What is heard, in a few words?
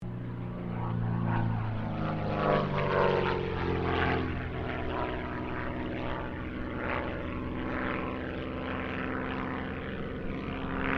AIRCRAFT CESSNA 195 PASS; Airplanes; Vehicles; Aircraft Collection; Twistedtracks